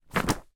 paper pack put on surface; foley

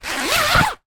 sport badminton racket cover sleeve zipper; zapsplat

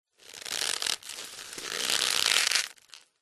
rope old stress creak sweatener; foley; zapsplat

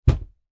impact elbow bash on wooden desk

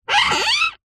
string rub wood squeak; foley

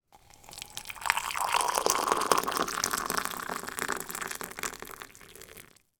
food drink water pour kettle into mug cup tea

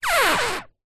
bicycle wheel turn rub squeak concrete ground; zapsplat

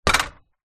household gate drive wooden impact hit

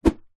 metal pole swing in air whoosh; foley

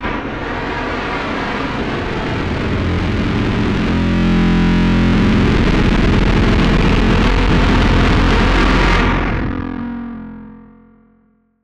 Hard Worker; martyn stonehouse

